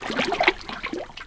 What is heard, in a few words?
wade